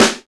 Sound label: SNR XC SNR; Club-50 - Foundations Roland; Cheez Snrs